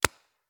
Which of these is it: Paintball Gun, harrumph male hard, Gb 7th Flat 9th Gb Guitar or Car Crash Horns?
Paintball Gun